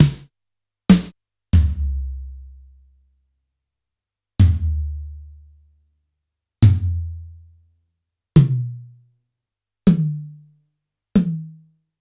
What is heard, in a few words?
Yamaha PSR-E323 Room Kit